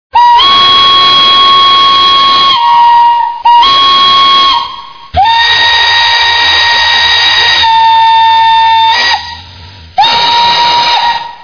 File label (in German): pfeife